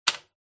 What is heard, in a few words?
door click